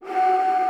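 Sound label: JAPANESE FL, BAMBOO FLUTE; Voice Crystal - Steve Reid Definitive Percussion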